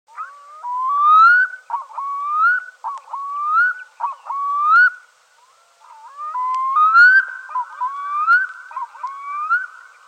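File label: black-throated loon voice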